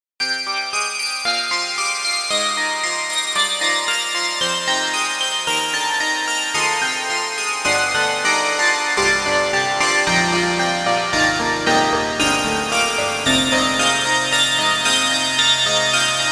Treated Lofi Toy Piano; Cakewalk; Rapture Expansion Pack Biolabs Vol.1

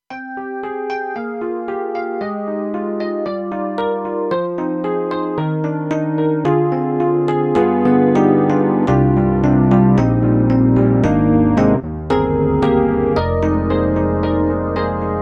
Korg TR-Rack Custom Roads

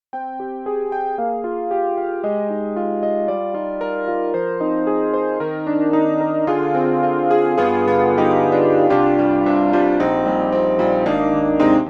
Yamaha CP80, Chorus, Wide Chorus; Steinberg; The Grand 3

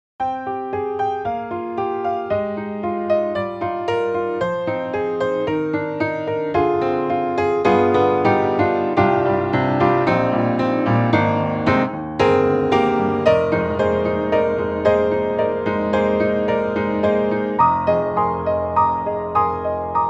UPRIGHT PIANO Standard; TOONTRACK; EZ KEYS

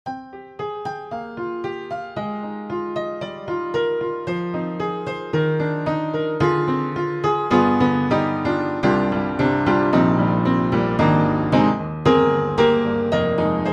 Vintage Upright Piano, Upright Pianos; Synthogy; Ivory II

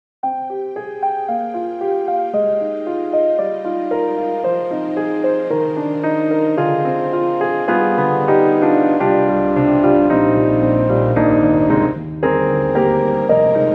Impressive String Section; Steinberg HALion 7; Verve

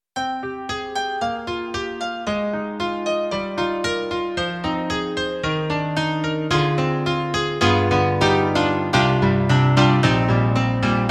Roland SonicCell Piano 2

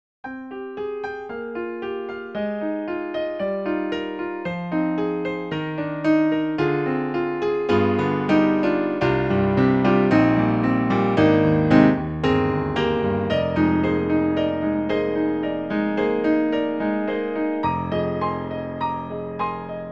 Bright Concert Piano

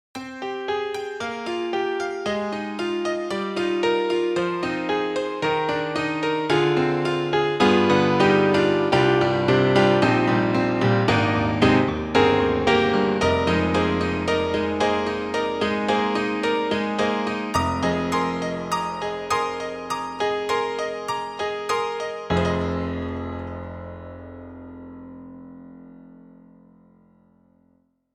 Dance Piano; Steinberg; Chart Dance Piano

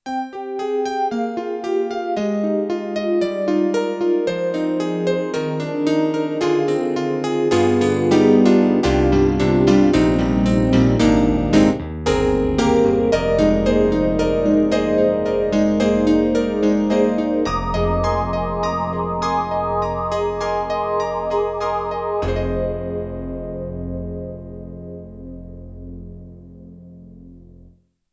YAMAHA MOTIF-RACK ES CP80 and EP

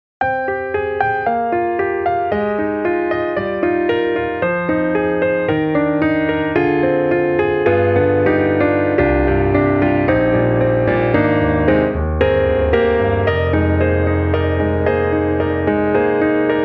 Studio Grand, Intimate; XLN Audio; Addictive Keys

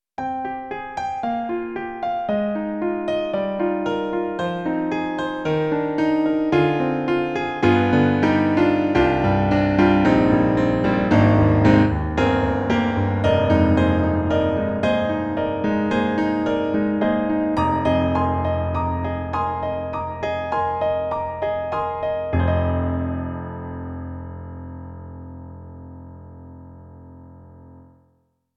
Roland SonicCell Rich Grand

